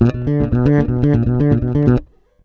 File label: RUMBLE, Bass, 112 BPM; Sampleheads